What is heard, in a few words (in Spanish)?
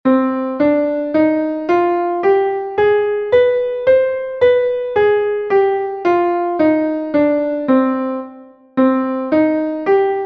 escala arpegio do menor; Escala e arpexio; Entoación a capella